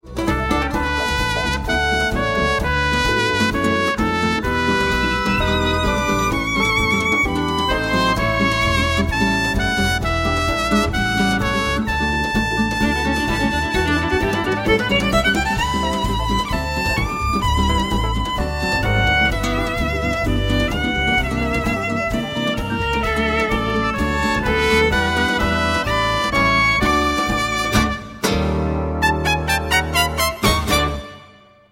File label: Tango; danske toner